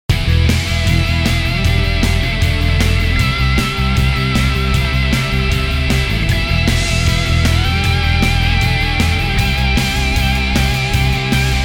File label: E320 Punk Rock; ENGL; Thunder